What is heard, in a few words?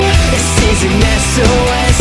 sos sms; rock